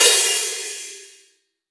Open Hihat, Cymbals; Vengeance Samples

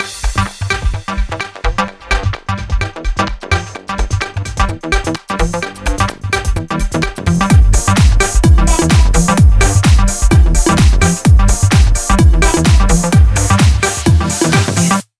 128BPM Mix; VFH1 128BPM Moonpatrol Kit

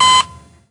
Synth Shots; Vengeance Samples

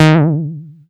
Bass Juno D#; Vengeance Samples

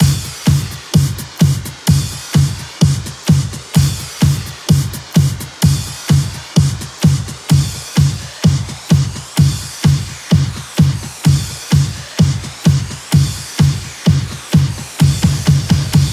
128BPM The Big Bam, House Electro Fills; 16Bars; Vengeance Samples

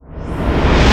VFX Short Fills & Reverse

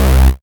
Bass C, Offbeat Bass

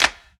Clap; Vengeance Samples

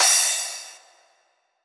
Crash; Vengeance Samples; VES1 Cymbals

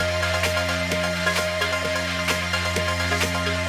Root F 130 BPM; 130-5 BPM; Vengeance Samples; VEH3 Special Sounds

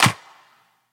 Claps; Vengeance Samples

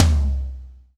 Minimal Perc; Minimal Percussion

